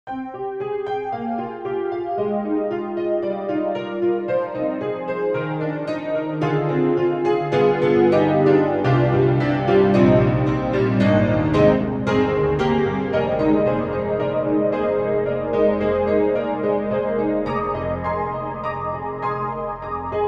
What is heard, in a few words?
Grand Pianos Studio 7ft Ambient C7; Synthogy; IvoryII